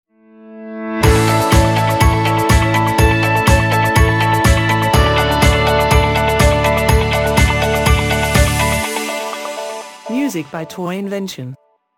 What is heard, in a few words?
Ride to Happiness stinger